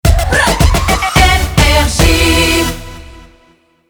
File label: Jingle Rapid Fire; NRJ